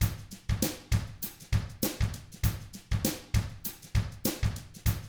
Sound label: FUNKY, Drums